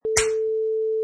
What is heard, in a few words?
Face Slap